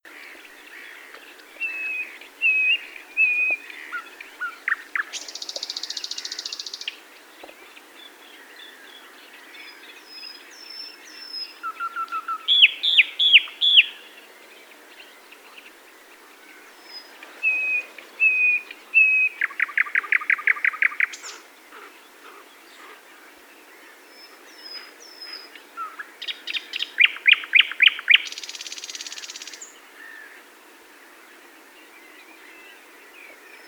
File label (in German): Sprosser; Mohrweihergebiet ERH; Soenke Tautz